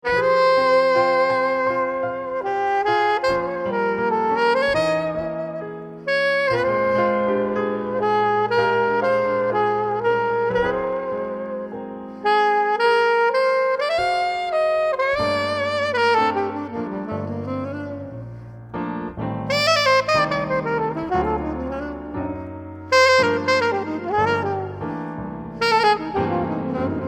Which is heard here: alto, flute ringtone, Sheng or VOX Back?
alto